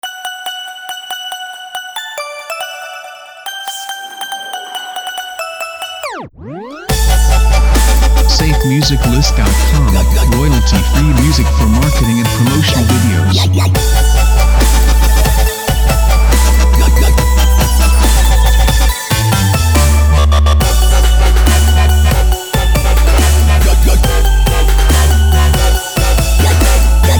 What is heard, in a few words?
Jingle Bells Dubstep; SafeMusicList